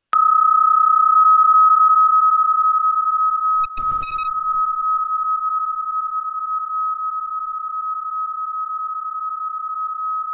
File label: bpsk31